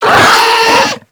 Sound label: Infested Charger Death, Enemies; Warframe